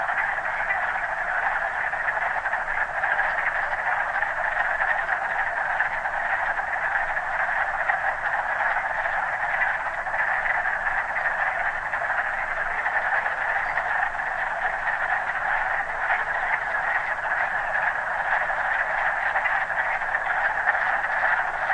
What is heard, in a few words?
frog wood frogs calling; Sounds of Springtime; parks; nature exploration